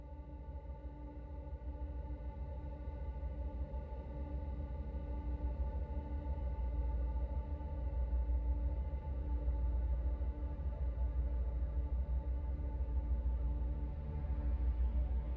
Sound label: noise